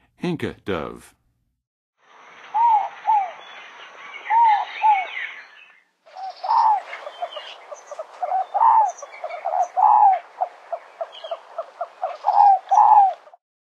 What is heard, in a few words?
Inca Dove, Western USA Songbirds; North America